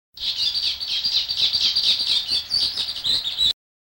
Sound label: violet-backed starling